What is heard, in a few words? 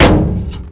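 door close; Mafie 1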